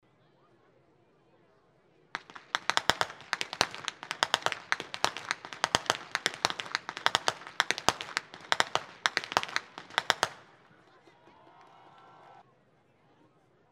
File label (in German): schnalzen, jugend